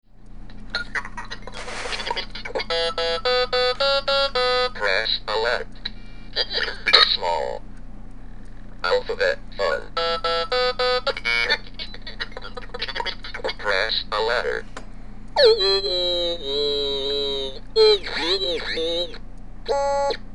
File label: sick cow